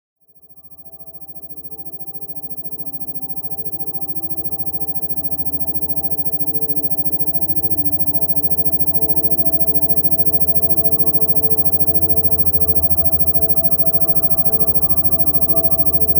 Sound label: Alpha Hypnotic Relaxation; RobinHood's AS Sessions; Moodstreams